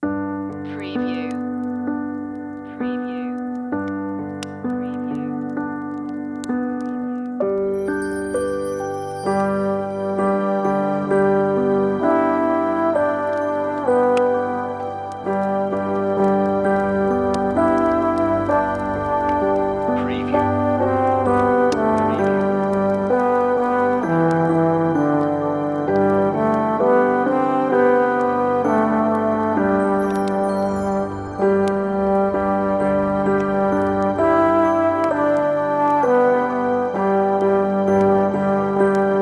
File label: The Holly and the Ivy Relaxing Christmas, on-hold music